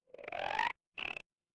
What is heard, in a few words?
animal frog